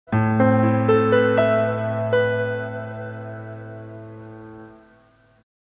Piany

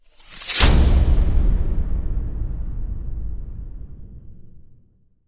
Hit Shred; Hit Shred Backtrack

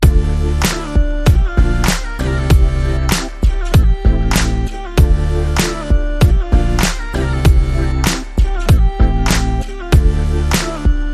Out Beat And Hook

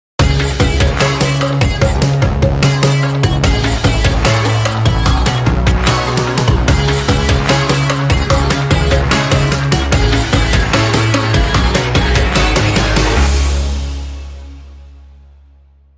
Dark Groove

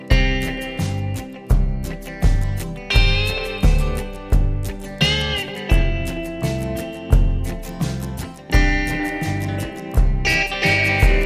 Steel Guitar Sunset

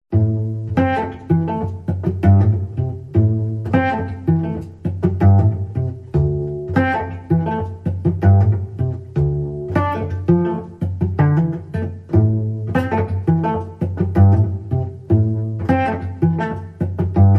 Cello Suite 1 Pizzicato

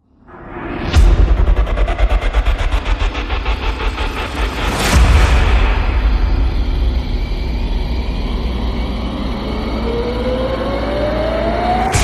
Rise Complex Hippocampus

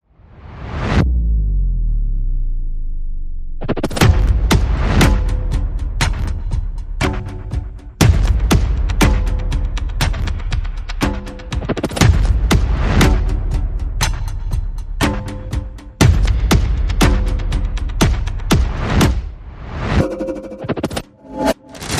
Percussion and Effects

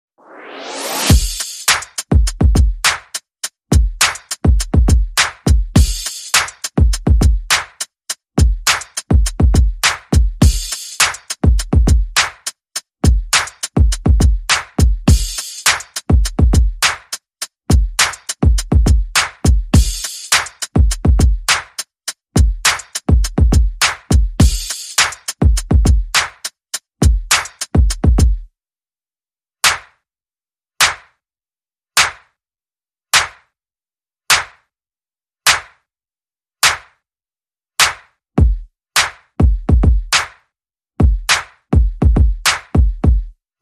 In A Jam, Perc and FX Only

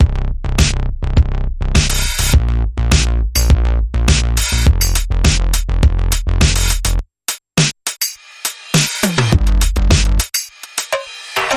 Seven Synths Sub

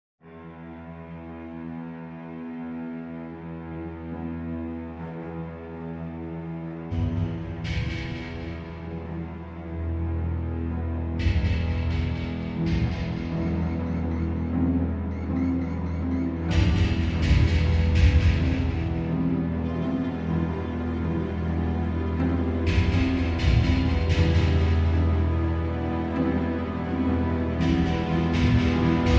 Industrial

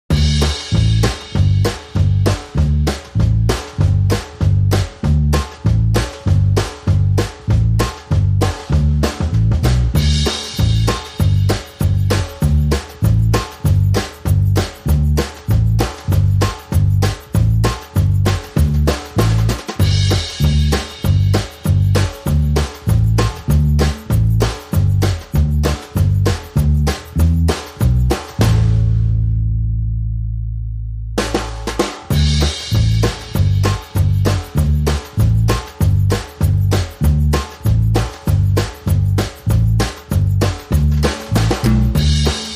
Drums Percussion and Bass Only

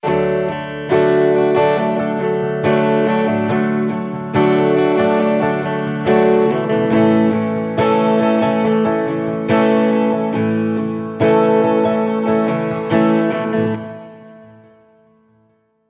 Calm Strolling, No Drums

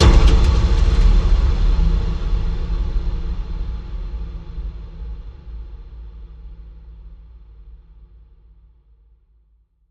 Scavenger Low Boom

